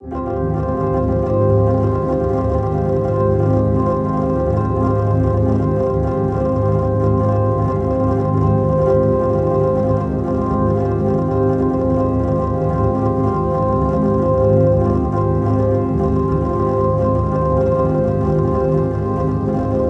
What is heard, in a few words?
FX Atmos G Major; Mascat; ambient or nature